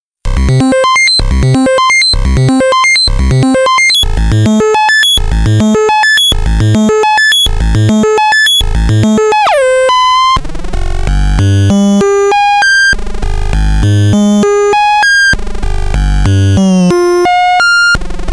wave only 4 wave triangle circuit bent gameboy noise; weeklybeats-2018